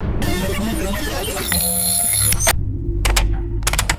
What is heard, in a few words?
futuristic digital scanner sweep and glitch